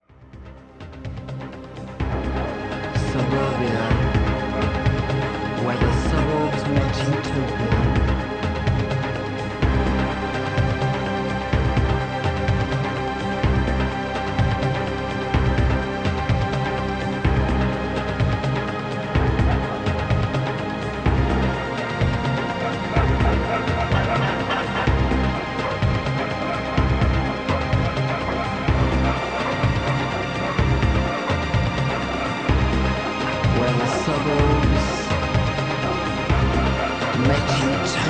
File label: Harman Kardon CD491 Test Recording